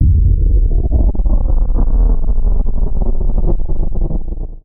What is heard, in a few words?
Gritty Boomer Transition